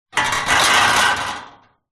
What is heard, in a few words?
metal frame bed loose hit rattle; impacts